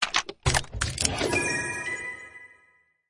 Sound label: open chest